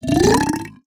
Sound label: Attack